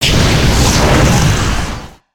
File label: Maelstrom Breath; Sorcerer